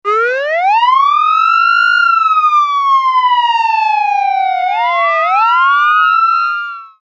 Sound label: Code 3 Siren